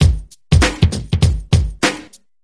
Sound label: swingy 99, drums